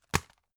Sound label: tissue package drop hard